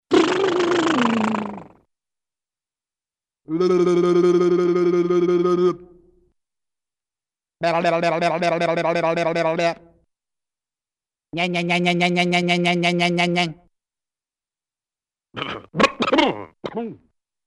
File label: Vocal 'br-r-r-r' 'bla-bla-bla', Clearing Throat, Cartoon; Hollywood Edge; Cartoon Trax